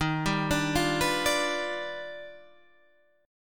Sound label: Eb Augmented Major 9th Eb down Guitar Half Step Down